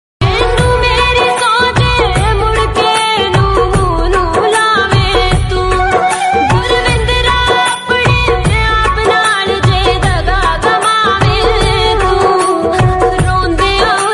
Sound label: punjabi old sad song